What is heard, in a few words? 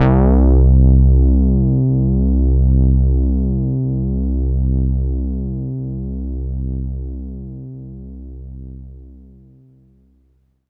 Polytaur c3, Korg Poly-800